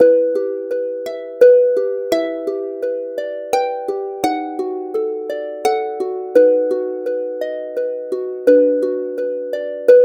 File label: Harp